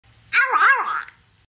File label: phone plug in sound